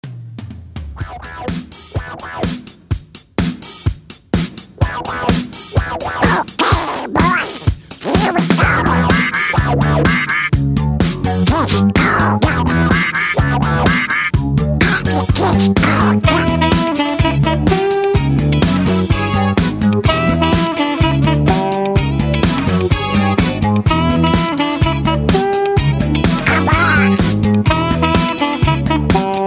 Hoedown Patter

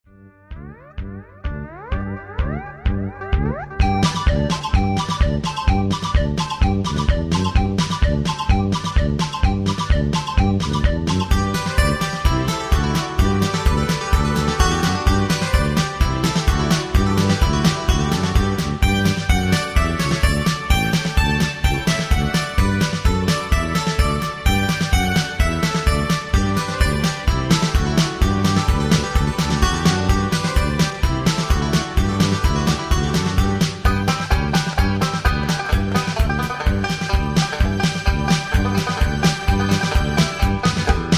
Hoedown Patter

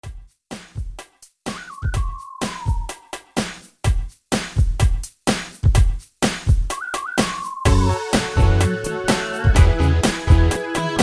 Instrumental

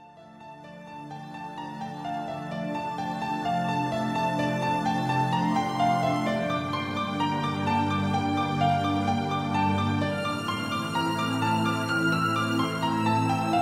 Patter

